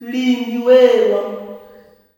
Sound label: "LINDY-LUM", MALE; Spectrasonics; Heart of Africa 2; S.MALEAMB